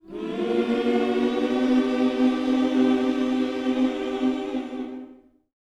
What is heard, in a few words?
MM MINOR, Vocal Gospel; Spectrasonics; Vocal Planet Vol.1 Gospel